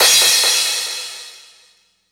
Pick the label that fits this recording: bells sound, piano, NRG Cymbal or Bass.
NRG Cymbal